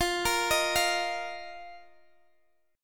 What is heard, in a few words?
F 7th Suspended 4th F down Guitar Drop D